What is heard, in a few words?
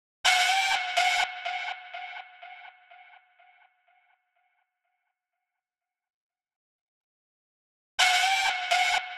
124 BPM E Min Flourish, Melody Loops; Loop Stems; Cymatics; Anubis